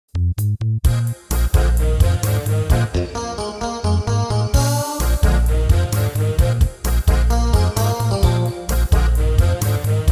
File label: steady blast blues